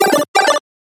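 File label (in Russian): zvuki dlia uvedomlenii na telefon v stile 8-bit, 8-bit style phone notification sounds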